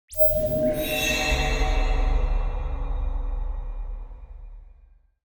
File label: sci fi wave